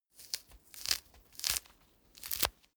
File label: mandarin peel sequence